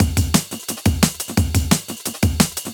175 D&B Drums; Pro Tools Essential Loops; Dance DJ; Drum n Bass

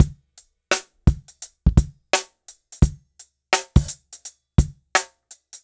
085 Pop Hip Drums; Pop Rock; Moderate Tempo; Pro Tools Essential Loops; Digidesign

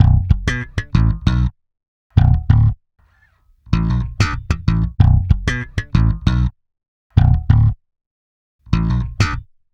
096 Pop E-Bass Bbmin; Pop Rock; Pro Tools Essential Loops; Digidesign

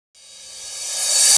Hip Hop Cymbal Swell, Drums; Digidesign; Pro Tools Essential Loops

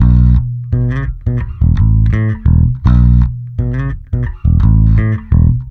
084 Pop E-Bass Bmaj; Pop Rock; Pro Tools Essential Loops; Digidesign

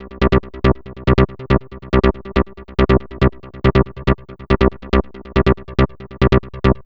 140 House Bass Cmin; Dance DJ; Digidesign; Pro Tools; Pro Tools Essential Loops

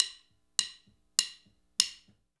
100 Groovin' Drums; Pop Rock; Moderate Tempo; Pro Tools Essential Loops; Digidesign

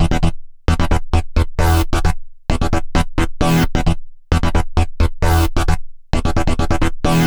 132 House Arp Emaj; Dance DJ; Synth; Arps; Pro Tools Essential Loops; Digidesign

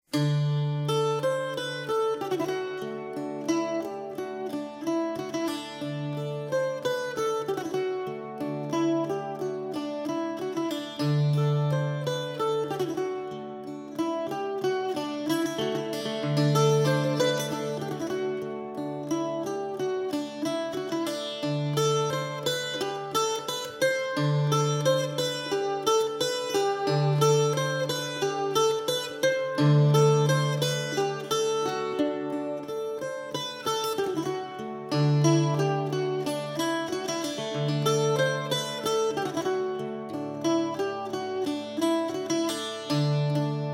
Cittern

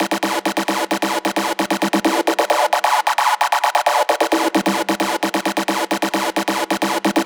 132 House Arp Cmaj; Pro Tools Essential Loops; Digidesign

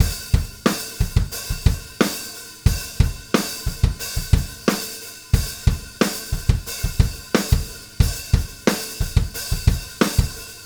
090 Rock Drums O-Hat; Pro Tools Essential Demos; Essential Loop Demos; Demo Session - Rock Hard; Digidesign

